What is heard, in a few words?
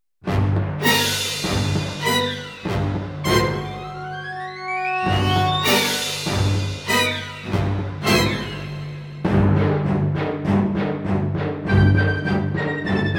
picc; Piccolo Demo 1